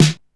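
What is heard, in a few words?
9000 snare, LINN 9000; Hollywood Edge; The Big Bad Beatmachine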